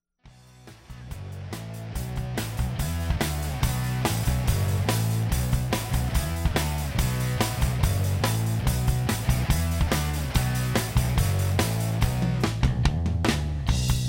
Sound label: Modern Rock